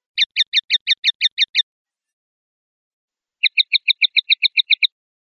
tateo; pardillo

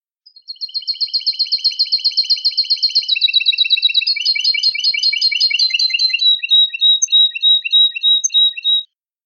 acanariado

